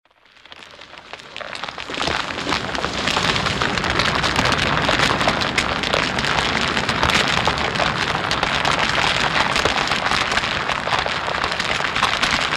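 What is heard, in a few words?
with cracks extensive damage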